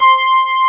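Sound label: BRS PF C4, brass; AKAI S6000 CD-ROM - Volume 1; SAMPLE WAVE MIXING 1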